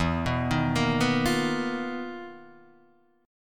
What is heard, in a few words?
B Major 7th Flat 5th E, chord shape; BM7b5/E; arpeggiated; JGuitar